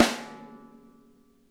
SNARE; Sonic Reality; Interactive Drum Kits

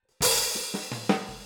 Boogaloo - Hi-Hat, 82 BPM; Drums; Intro; Sonic Foundry; Drums from the Big Room The Mixes

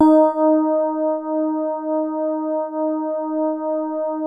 COND HARD D#3, E-PIANO; Music Store - Claudius Diemeter Schwerlos

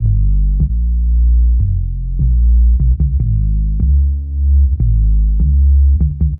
Boomer Bass, Drum N Bass; Sonic Foundry; Knutrix Drum & Bass

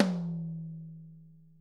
ROTOTOMS; Sonic Reality

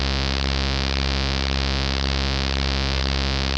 BASS C3 L, Jungle; JGL BASS; USB Soundscan vol.05; Explosive Jungle; AKAI; 90s sample CD

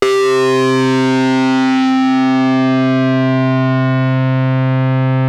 SYNC SOFT C3, LEAD; Music Store - Claudius Diemeter Schwerlos

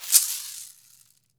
CAXIXI; Brazil

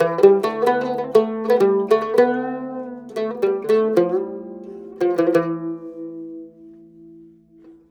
CUMB, CUMBUS, Mediteranean Traditions

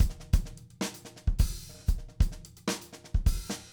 GADD, drum

